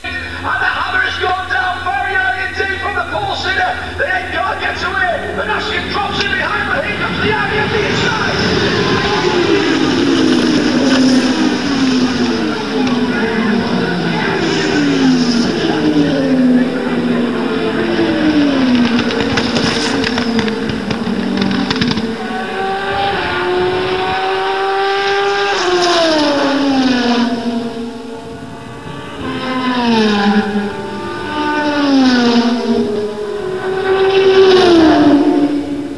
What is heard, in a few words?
race ambience; carrera; cstrike